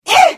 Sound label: Hit; The Elder Scrolls III - Morrowind